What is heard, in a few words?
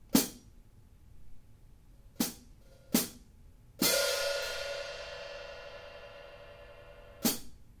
14in K Constantinople HiHats, cymbal